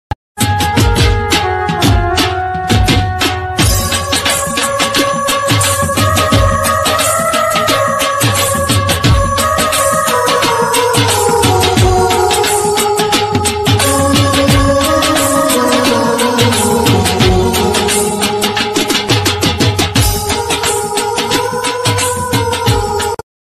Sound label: aarti instrumental; gujarati